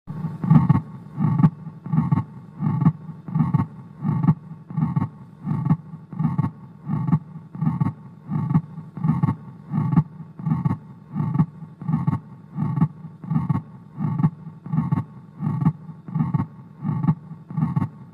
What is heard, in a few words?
Atrial septal defect; simulatorvzorky